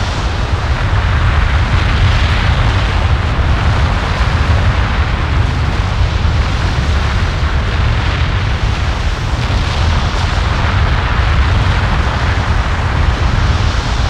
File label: hell atmos; ambient, hell; Garry's Mod; tf2